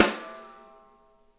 SNARE RIM; Sonic Reality; Interactive Drum Kits

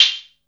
DRMS, Underground Hip Hop

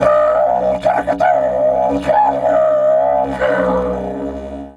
C#3 WILD, Didgeridoo; Big Fish Audio - Didgeridoo and Other Primitive Instruments

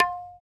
TABLAS, INDIA; Roland - LA Composer vol. 1

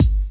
KIT, Dance Mega Drums, HIP; Best Service

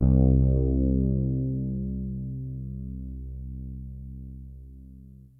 WET FRETLESS, BASSES; Kid Nepro; Elite

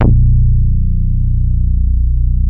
ESQ 1 REZ BA, SYNBASS; Synthesizers; Soli-Music; World of Synthesizers VOL-1

